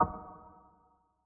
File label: GUI Sharp positive; The 39 Steps